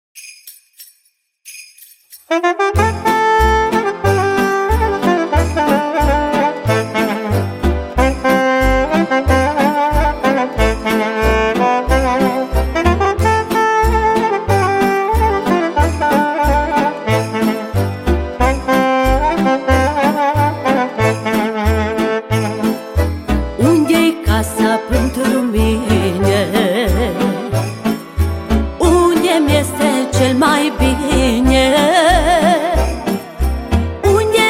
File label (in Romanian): Colind